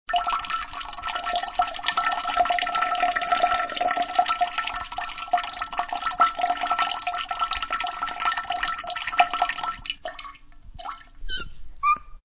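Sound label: sounds of peeing, soft squeaks; Fluttershy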